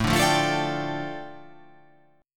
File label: A 7th Suspended 4th Sharp 5th, A, down